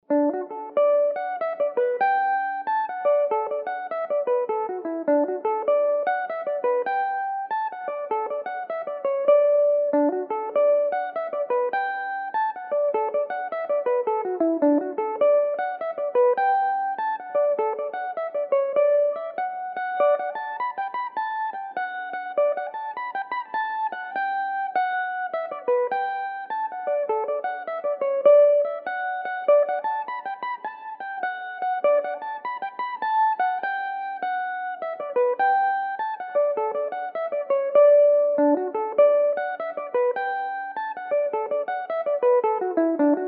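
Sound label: D major belmuse, irish mandolin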